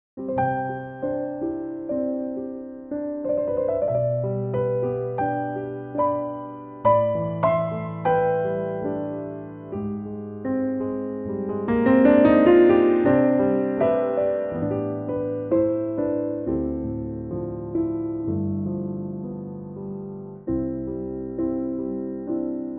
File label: C piano; Pianotrak; key - C - vocal range - B to D